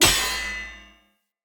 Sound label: Short Sword Medium Impact Metal Shield; Weapon Impacts; Player; Swords; Combat